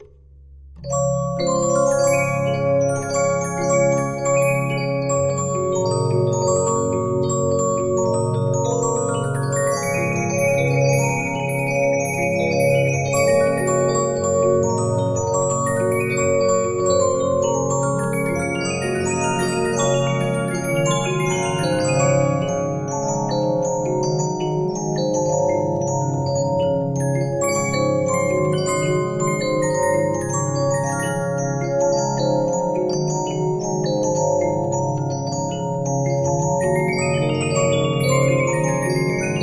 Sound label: Regina, 15inch disc musicbox